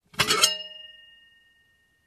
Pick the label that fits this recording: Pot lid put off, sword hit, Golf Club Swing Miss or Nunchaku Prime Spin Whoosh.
Pot lid put off